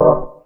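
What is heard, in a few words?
organ, Stabs; E Lab; XTc Files of House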